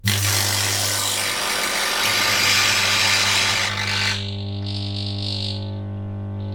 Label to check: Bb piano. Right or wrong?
Wrong